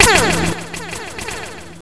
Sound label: SHOOTING; Roly-Polys no Nanakorobi Yaoki